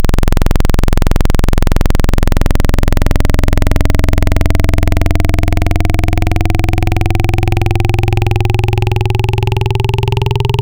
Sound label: Digital Saw Formant; Synthetic's Serum Collection; Echo Sound Works Core Tables